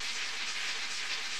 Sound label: Fireball Loop; Hollow Knight; Flamebearer Fireball Loop